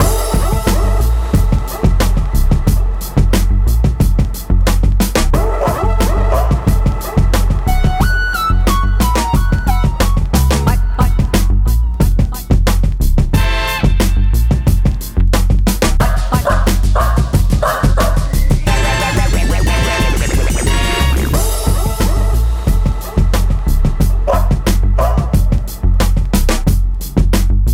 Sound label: dialog